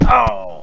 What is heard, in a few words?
death